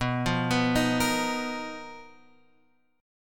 B Major 7th Flat 5th B down Guitar Open F; BM7b5; arpeggiated; JGuitar

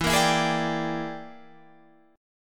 E 7th Sharp 9th Flat 5th, chord; E7#9b5 strummed; JGuitar

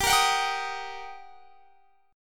G Minor Major 7th Sharp 5th; strummed; GmM7#5

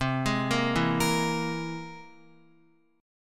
C Augmented 7th, Guitar; arpeggiated; JGuitar

